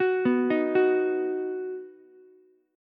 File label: B Major, Ukulele; arpeggiated; 11,11,11,9; JGuitar